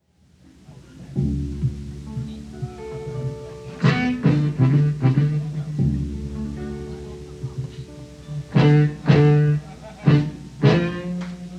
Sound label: Slow Instrumental